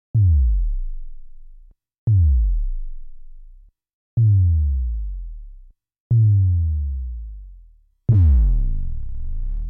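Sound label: Bass Drum Tom Sounds, Sample of a kick drum from the mono/poly; korg mono poly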